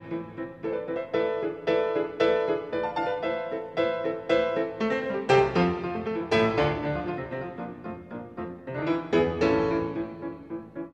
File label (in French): Concerto pour piano; Edward Grieg